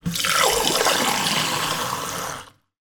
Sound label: household glass fill water tap fast